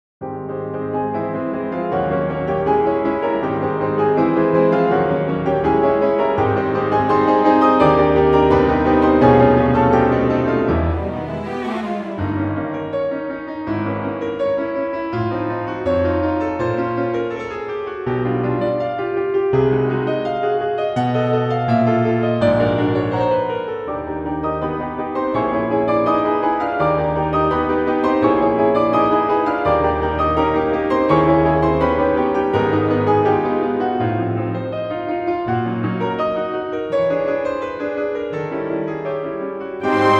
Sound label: 2 Pianos; Poulenc